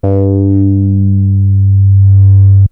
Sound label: fat bass c3, Kawai SX synths